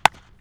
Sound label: Baseball Bat Hit; Sports & Recreation